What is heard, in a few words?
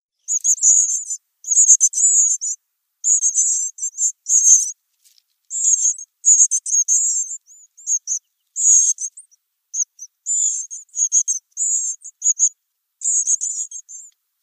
Yellowhammer nestling